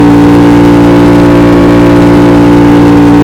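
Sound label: cobra427 off high,car engine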